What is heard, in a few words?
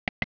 MOUSE; NATURE